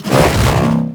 Hoverboard Jump Spin B; Vehicles; Warframe; Venus Hoverboard

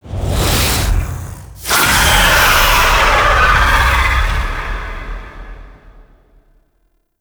Vocal; Narmer Outpost; Chamber Archon Intro; Nira

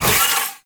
Kick Whoosh; Warframe; Venus; Venus Terra Moa; Abilities

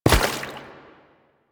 Step Water, Footsteps; Lasrian Butcher; Lasrian Soldiers; Foley

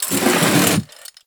Classroom Chair Drag; Ambience; Warframe; TheNewWar; Zariman

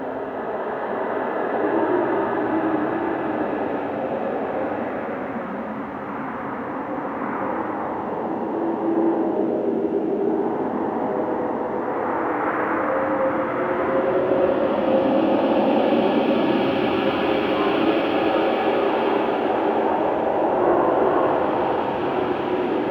Mountain Pass Cave Entrance Amb Loop; Ambience; Warframe; The War Within

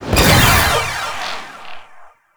Contagious; Warframe; Nineteeen Ninety Nine; Deep Archimedia

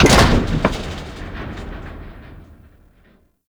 Ship Hull Impact Medium; Hull Hit Effects; Warframe; RailJack

